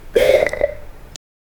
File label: a subt, nervous; genre sound effect